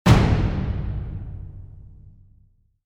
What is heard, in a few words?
Trailer Hit